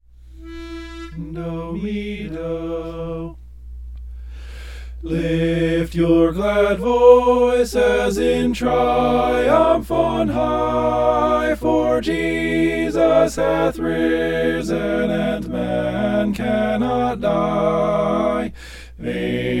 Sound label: mixed